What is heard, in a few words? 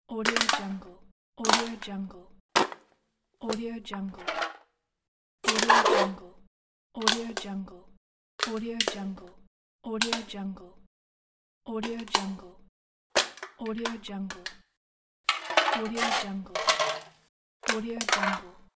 smashing tin can